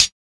Closed Hats

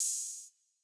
Open Hat

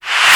Reverse, Open Hats

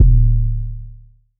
808 D; Cymatics

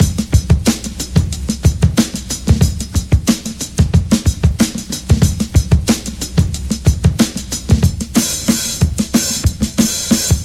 Drum Loop 92 bpm

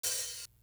Open Hat

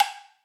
Percs

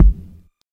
Kk, Kicks

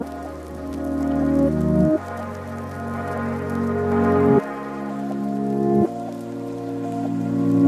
BPM 125; VLONE

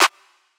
Clap

